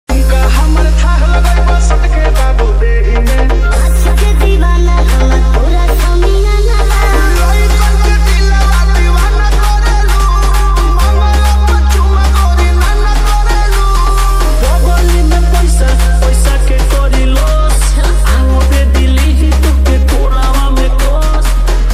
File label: Bhojpuri Mix